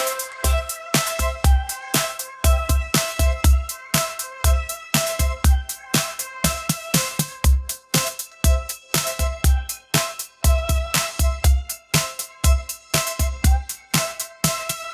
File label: quirky synth pop parade